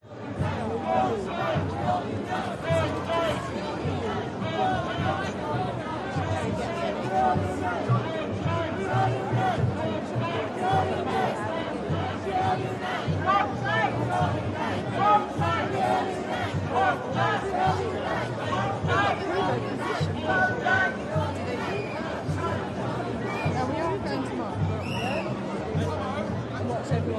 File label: atmos protest steady chants, london; nhs; london music mixing